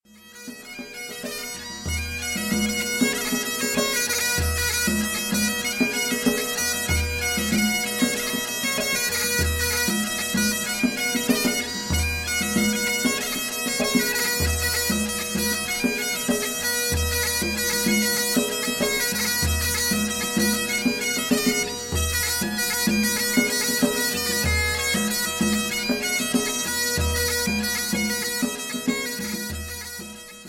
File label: Hornpipes